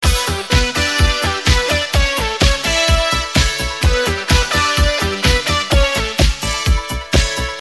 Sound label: Loop